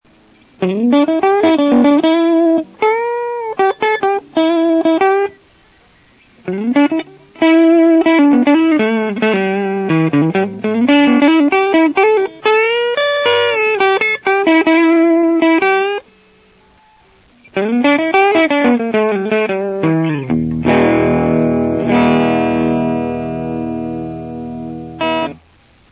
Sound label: dirty champ